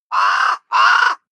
bird raven

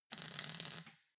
weld sizzle short

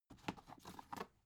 dvd case pick up

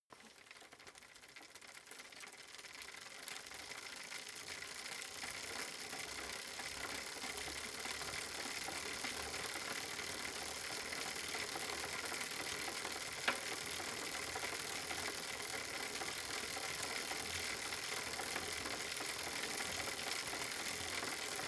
bicycle street drive accelerate

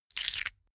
key ring large grab hard

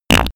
fart deep

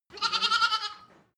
goat lamb baa

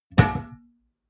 glass door small close hard

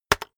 keyboard external enter hit fast

